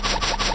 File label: eggyra move